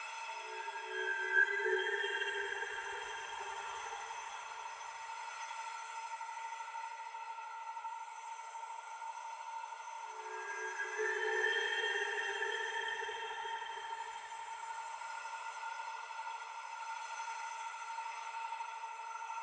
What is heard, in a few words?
mefistotel idle, anomalies; Arrival